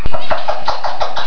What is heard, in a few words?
clucks, parrots